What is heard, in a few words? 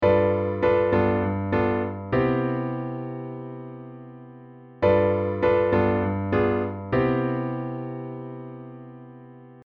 Tension Resolve